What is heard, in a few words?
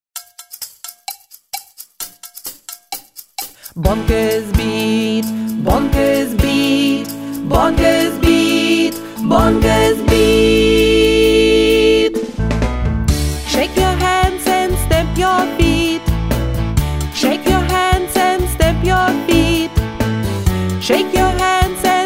Bonkers Beat